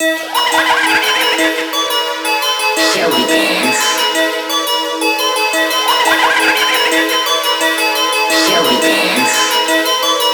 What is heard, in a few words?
Instrumental Ultra Sped Up